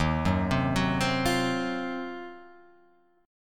D sharp Minor 6th Add 9th D sharp Guitar Open F, chord; arpeggiated